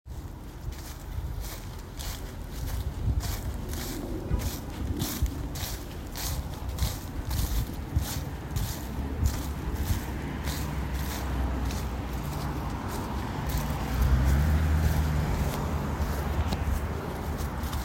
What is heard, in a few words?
Walk through crunchy leaves